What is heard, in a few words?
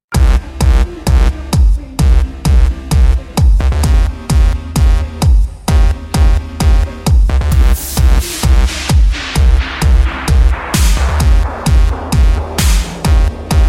Big Bass